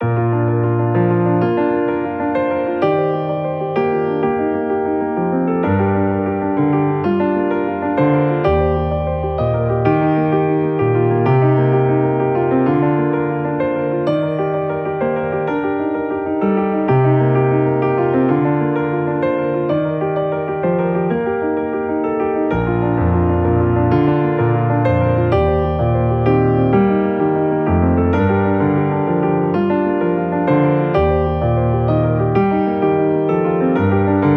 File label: Recollection loop, BGM